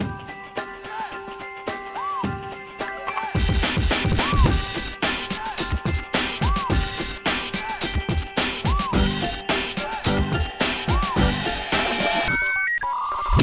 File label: Christmas Instrumental; friday night funkin vs. impostor b sides